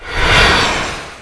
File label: BackGround Goblin; Eft